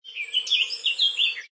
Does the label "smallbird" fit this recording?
Yes